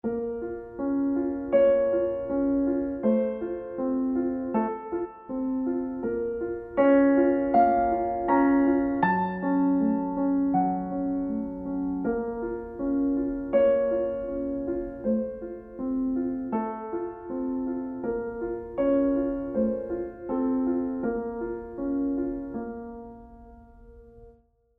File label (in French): piano fin